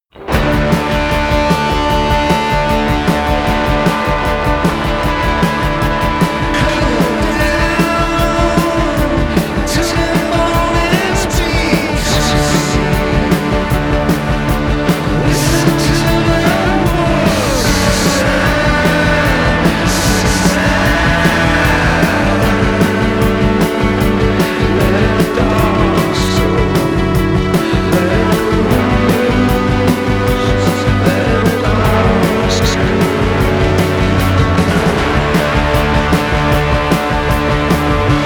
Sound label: Disco